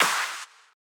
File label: clap